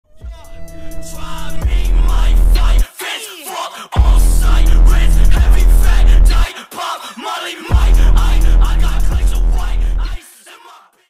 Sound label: bass boosted